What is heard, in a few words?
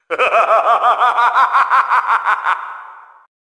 evil laugh